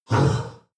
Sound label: demon dark voice thick groan; Creatures; kreaturen